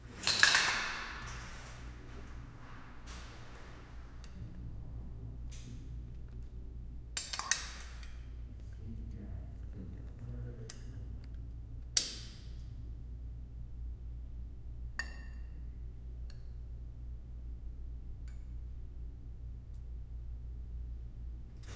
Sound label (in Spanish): cortina de terciopelo